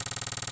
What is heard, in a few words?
roll